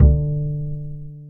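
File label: double harm D3, Bass; AKAI S6000 CD-ROM - Ultimate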